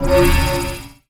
Explosion; Magician

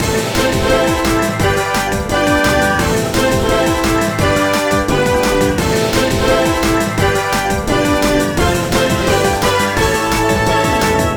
Bgm bonus guild dragon night

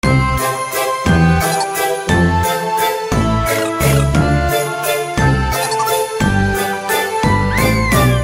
Bgm new year

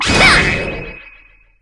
Transform Start; Muay Thai

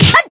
Transform End; Muay Thai

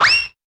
Throwing Normal; Cherry

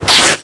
jump; Snakefruits cookie

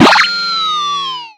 note spreads full; Rockstar

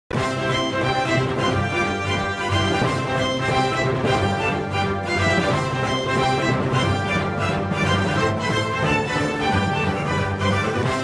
Bgm violinist Bonus Night

